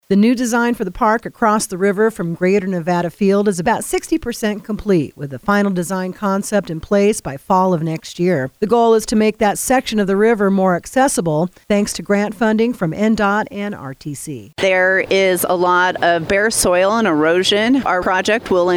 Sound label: rap